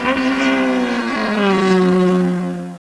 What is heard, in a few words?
Openning; Racing Days R DEMO